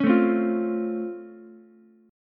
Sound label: Db Augmented Major 7th, down, Ukulele; strummed; JGuitar